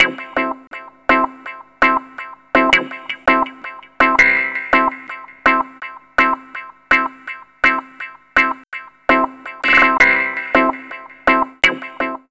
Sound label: Skanker 165 E, Guitars; Total Drum&Bass